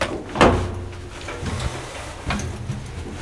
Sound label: Vehicle reloads; Red Orchestra: Ostfront 41-45